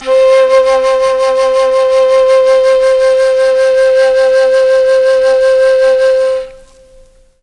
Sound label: C3 flute, mellotron